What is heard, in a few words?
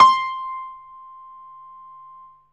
PIANO, Dance Mega Synth; Best Service